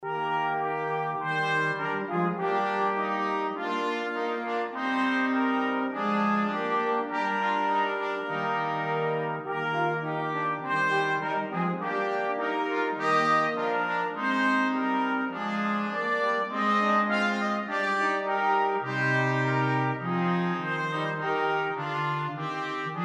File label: Brass Quintet; Liedboek; U zij de glorie